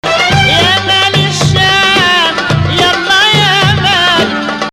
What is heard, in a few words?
2nd Rast, maqam